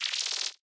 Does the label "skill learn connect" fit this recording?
Yes